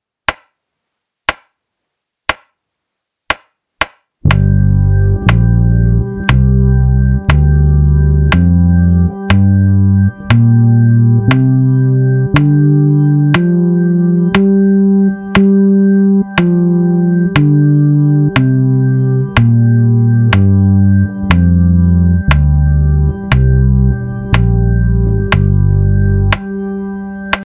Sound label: Minor pentatonic, bass; a bassists guide to modes